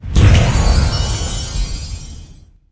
bonus start; Rescue Team3

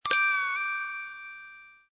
found clue; Agatha Christie Death on the Nile

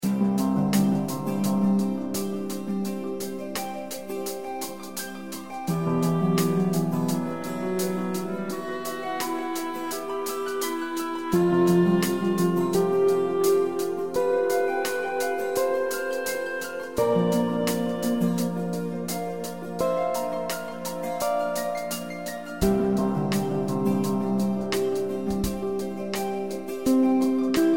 music; Rescue Team 2

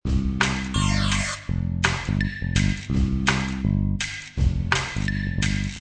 music; Shopmania

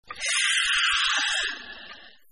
coffee machine on; Delicious Emilys True Love

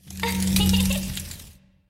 succub appear; Rescue Team3

